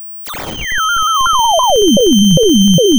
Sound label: pop